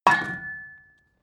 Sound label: metal impact bucket solid